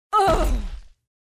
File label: female dead